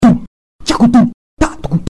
beat

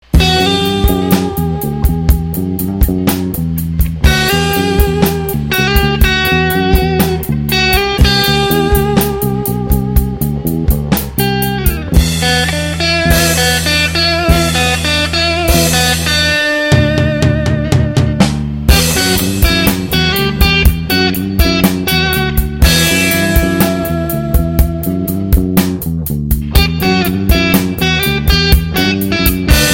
instrumental

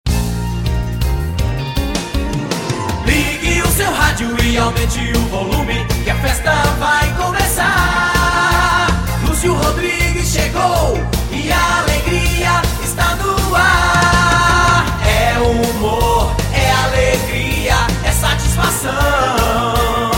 jingle

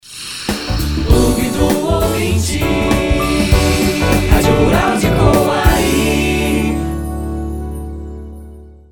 jingle; passagem cheia clube do ouvinte 2013

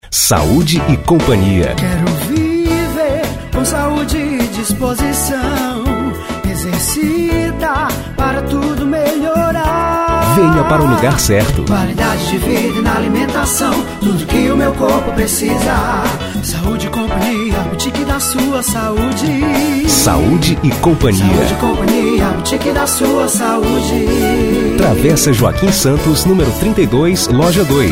jingle; saude companhia

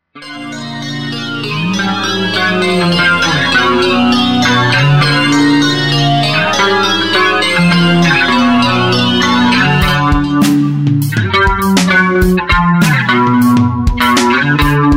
regression low embryonic cells; lowhipnoise

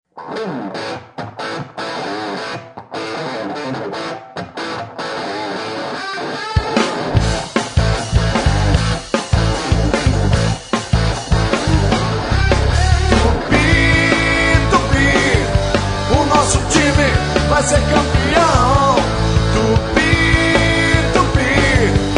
rock; tupi rumo vitoria 2006

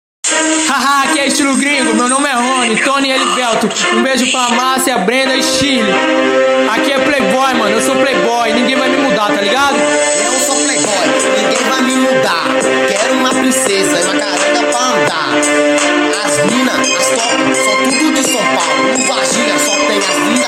Hip Hop

